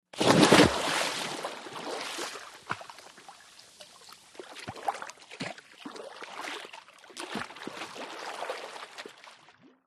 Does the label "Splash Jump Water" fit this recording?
Yes